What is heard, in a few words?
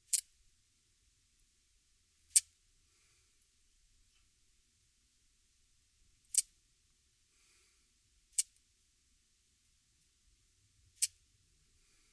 speckled bush cricket